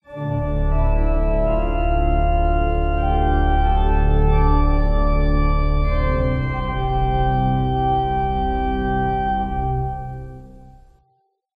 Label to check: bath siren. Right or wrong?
Wrong